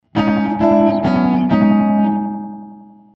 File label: Old School Metal Tank Reverb